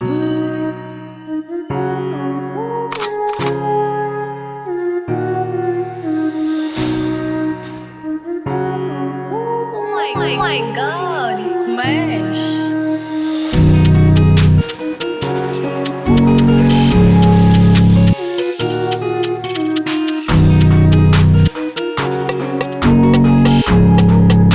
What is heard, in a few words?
Holy drill